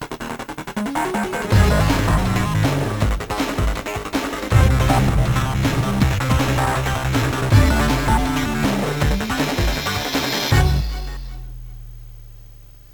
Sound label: Music Outro; Warframe; Caliber Chicks Minigame